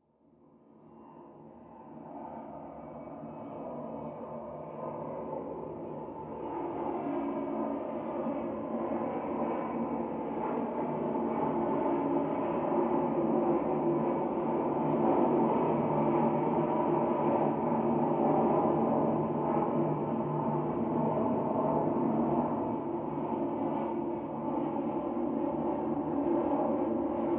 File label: Airplane; Medium By Overhead, Through Closed Window. Int Pov